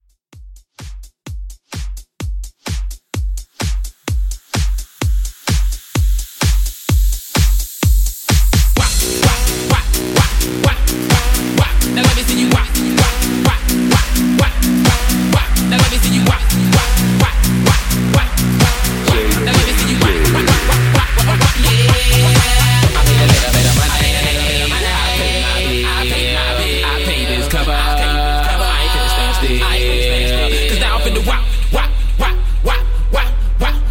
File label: Dance Mix; Remixfreakz